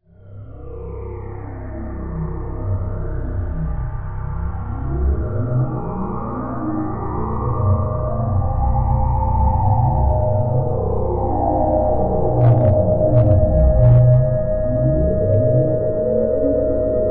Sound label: Sci-Fi, Ambient Electronic Science Fiction Soundtrack